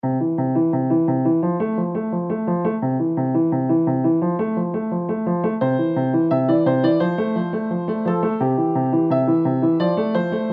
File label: Good Times